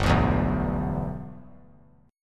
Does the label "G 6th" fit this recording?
Yes